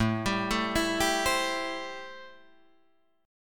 A-Minor 7th A Guitar Drop D

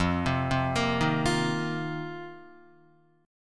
F 7th Suspended 4th F down Guitar; arpeggiated; JGuitar

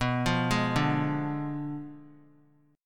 B Diminished 7th B down; Bdim7 arpeggiated

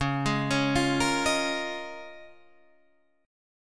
C sharp 6th Add 9th C sharp down Guitar Open D

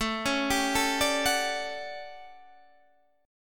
A 13th, Guitar Standard, chord; arpeggiated

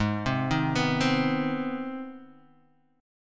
C Minor Major 7th Sharp 5th G sharp

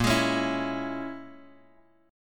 Bb Minor 7th Flat 5th, Guitar, Open G

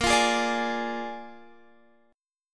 Bb 7th Bb Guitar Half Step Down, chord

